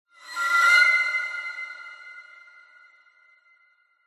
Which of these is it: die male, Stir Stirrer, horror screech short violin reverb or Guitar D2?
horror screech short violin reverb